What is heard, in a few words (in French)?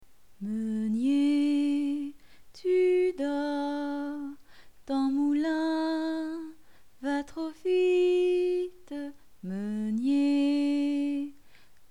Meunier tu dors chant